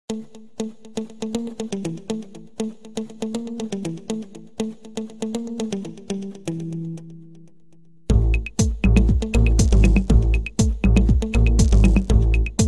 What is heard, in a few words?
electro beat, ringtones electronic